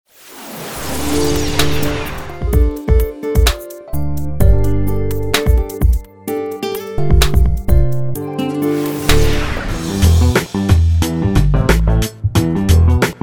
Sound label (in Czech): ukazka hip hop rnb